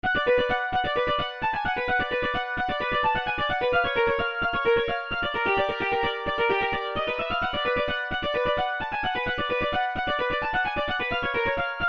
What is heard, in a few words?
Piano